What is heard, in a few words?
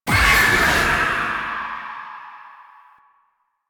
Apparition Spectral Cast; Warframe; Warframes; Dagath